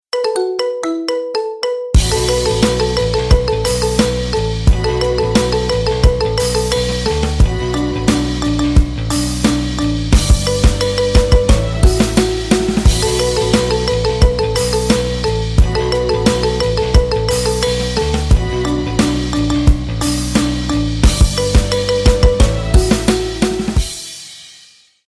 marimba remix; Рингтоны Без Слов

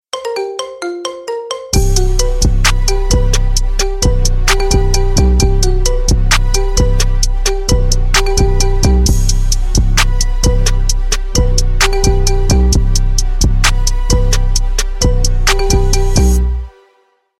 marimba remix; Рингтоны Без Слов

